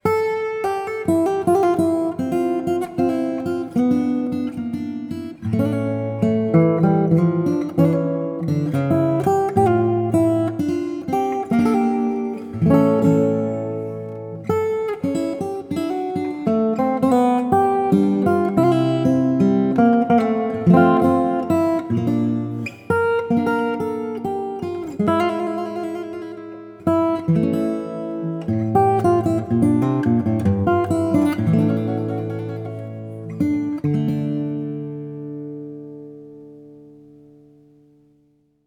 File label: Prelude; Touchstones - The Evolution of Fingerstyle Guitar